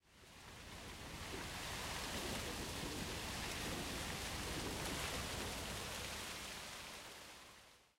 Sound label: leaves